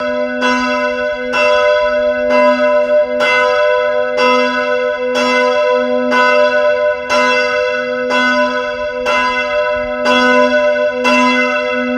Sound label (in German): Glocken Massenheim; Bad Vilbel